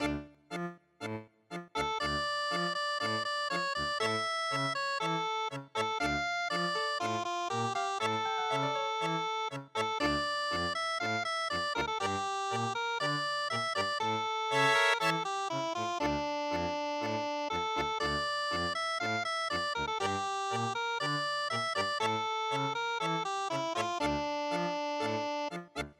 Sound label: tango